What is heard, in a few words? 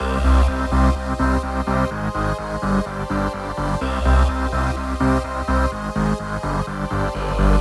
Melody 1, 126BPM; Vengeance Samples; Photon Kit